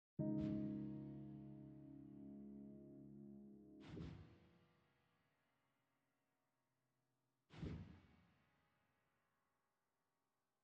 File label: Keys